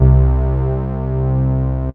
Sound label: Analog